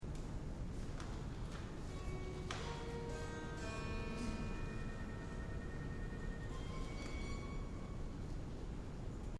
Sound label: Dulcimer